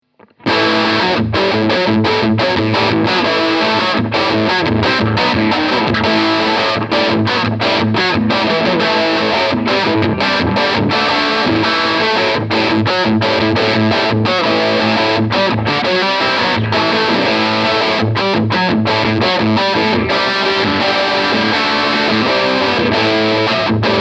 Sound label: Tone King Gremlin Tweed Lead; 70th Anniversary